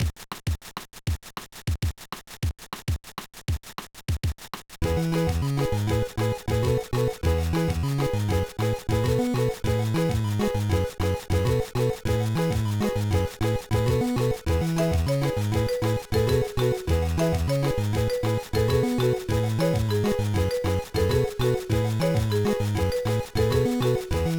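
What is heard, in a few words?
background music; No No No Puzzle Chailien; GBA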